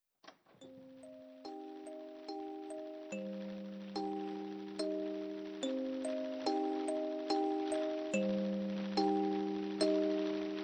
Music Box; Seiga

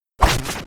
shovel attack

